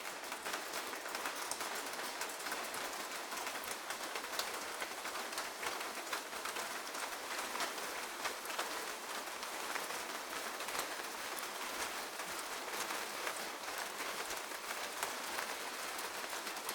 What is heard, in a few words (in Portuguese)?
Chuva em casa